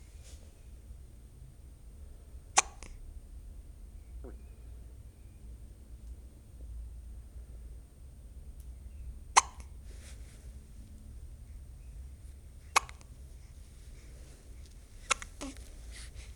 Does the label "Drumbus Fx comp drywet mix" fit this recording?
No